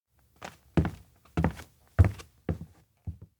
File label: steps indoor abandoned cafe walk away; anemoi